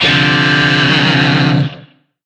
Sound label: Shawzin Min Chord D; Warframe; Lizzie Shawzin; Chords